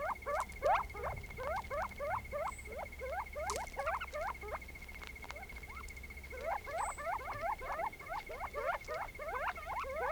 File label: Gambles Quail